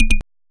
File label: switch, Interface Sounds; Kenney's Sound Pack